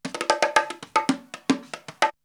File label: Drumloop; ZERO G - Datafile DISC 2